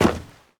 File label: snow land; Golden Crayon Sounds